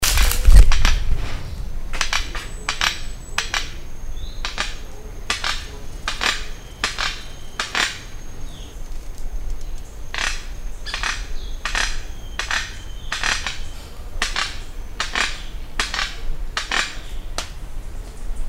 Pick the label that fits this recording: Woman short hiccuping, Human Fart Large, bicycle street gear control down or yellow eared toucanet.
yellow eared toucanet